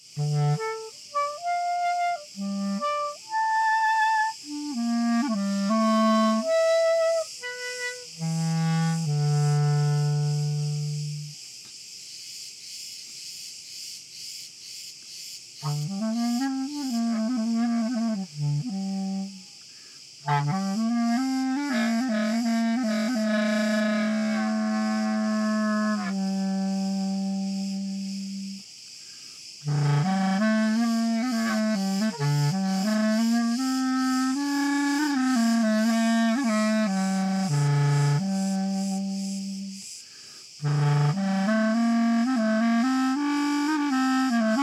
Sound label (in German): Frei Hoch Tief Rau Ton Steigerung Spiel